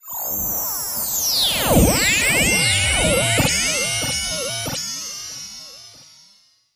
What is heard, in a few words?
Warp Field Pulse